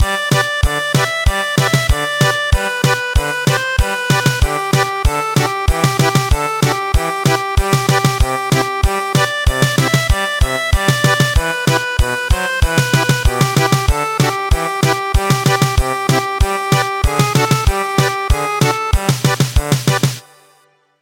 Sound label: German Polka; Long I gaze across the snow